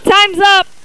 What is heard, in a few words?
times up